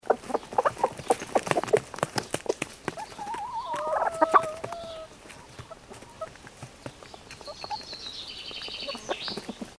chicken clucks eating snails bashing, Chickens Eating; Chickens Eating Snails - Chickens Cluck And Run Around Whilst Bashing Snail Shells Against; Birds; Animals; McKinneySound